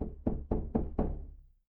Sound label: door knock